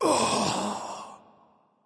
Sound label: grunt hurt; smite; voicepack; hun batz